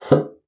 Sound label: BASSOON; Xsample VOL-6